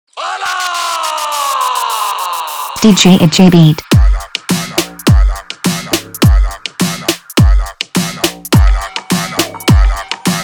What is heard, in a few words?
Club Beat Remix Hindi Dj Song